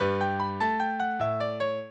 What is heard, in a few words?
minuet, mozart